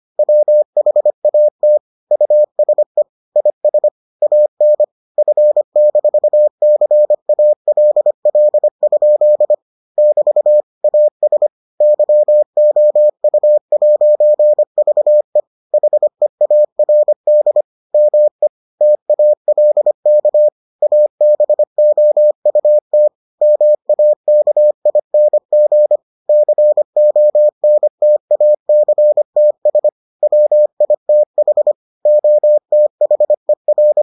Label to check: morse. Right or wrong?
Right